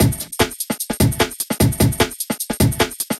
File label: Think Break processed